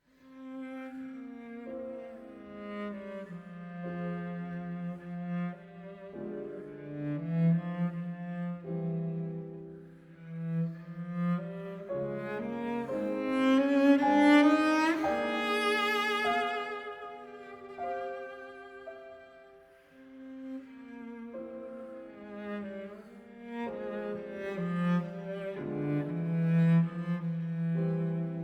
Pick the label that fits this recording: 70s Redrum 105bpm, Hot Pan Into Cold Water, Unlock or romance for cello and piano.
romance for cello and piano